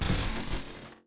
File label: brick